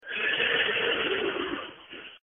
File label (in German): Wiehern